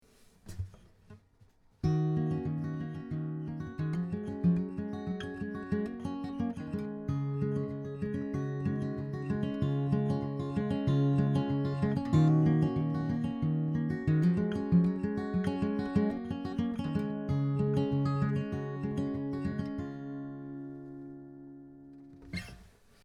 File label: Beyerdynamic M90 PRO X acoustic guitar microphone